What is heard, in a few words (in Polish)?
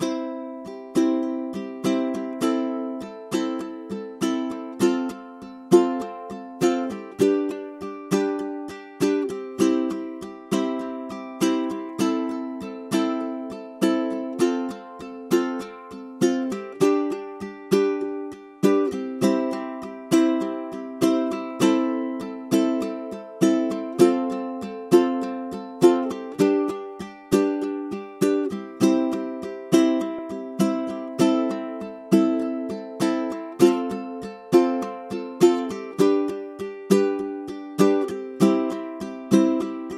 bicie ukulele